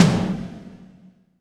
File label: Snare, Alesis DM5 Drum Machines